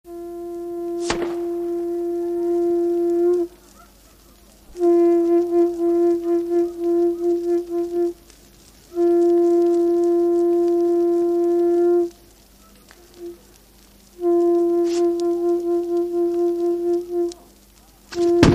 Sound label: Positano, Italy Conch Shell Horn on New Year's Eve